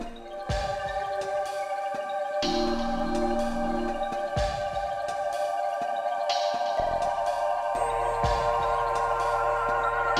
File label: crash ship; maeL